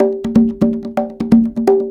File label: Percussion Loops, Bongo & Conga; Houseworx